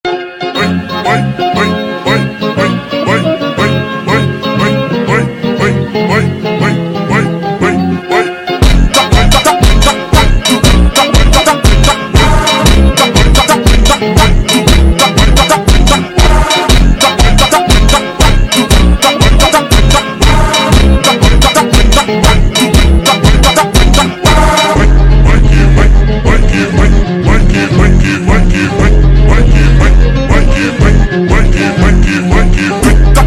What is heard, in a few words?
Funk super Slowed